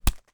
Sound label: Hammer Hitting A Head